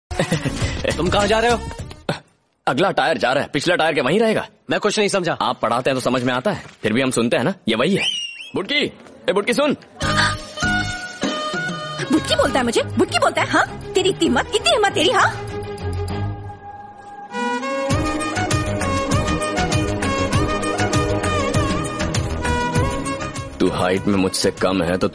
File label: Romantic hindi Ringtone